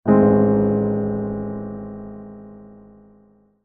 uneasiness; animal

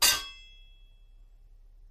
metal hit

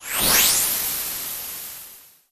warp

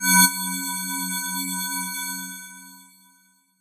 beam

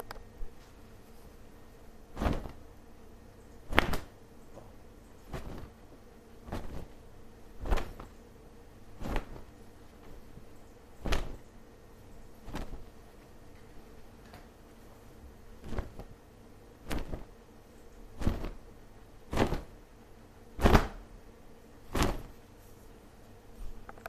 waving cloth; operation

